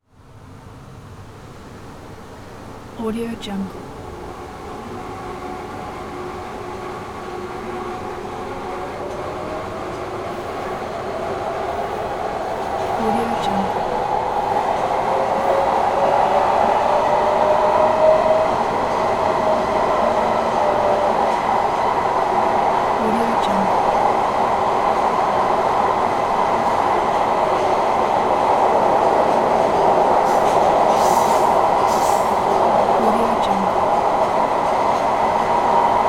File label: metro redline